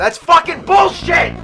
rage; w00tguy; arcade

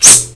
spark

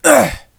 pain

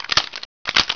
reload; portal house; sforce

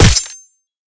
hit, knife; cof; guns

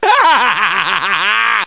haha; deadpool

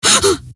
gasp, scientist female

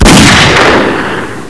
357 shot

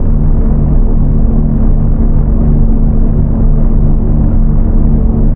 b17 far

